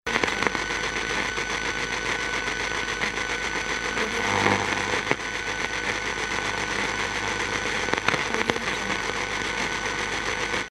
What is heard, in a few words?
radio waves